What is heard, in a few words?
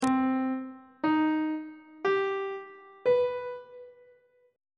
c minor major 7th chord intervals on treble clef